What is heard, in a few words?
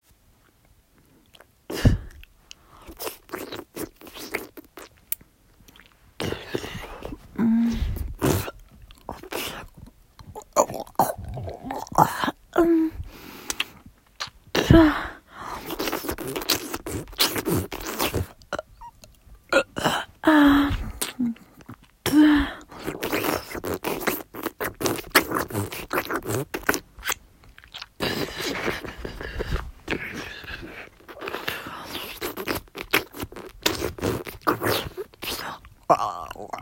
Practicing my deep throating, gagging, spitting, sucking noise